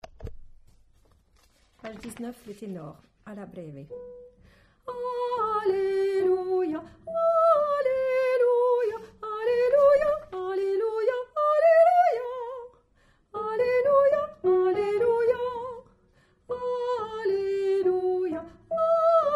Tenor

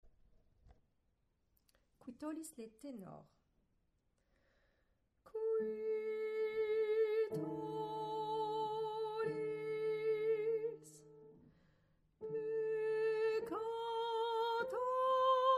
Tenor

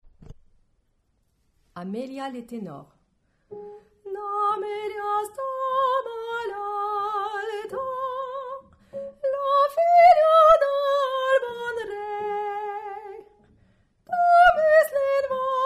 Tenor; catalan

